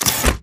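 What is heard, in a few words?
Stalker Movement Servo Head Retract; Titanfall 2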